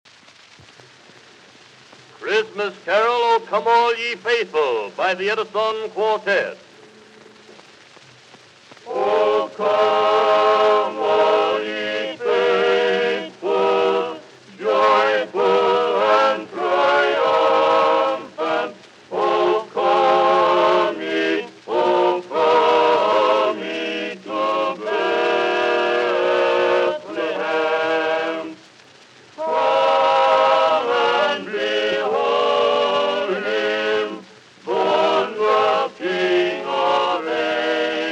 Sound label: O come all ye faithful